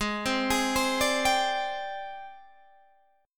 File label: Ab Major 7th Flat 5th Ab, down, Guitar, Half Step Down